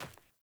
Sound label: Sandals Run Dirt, Footsteps